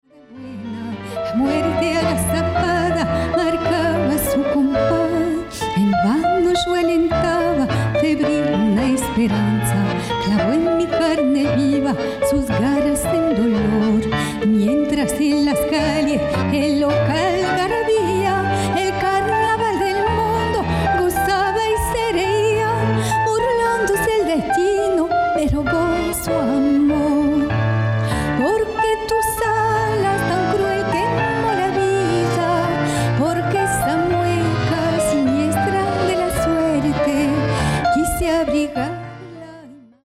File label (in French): tango milonga chant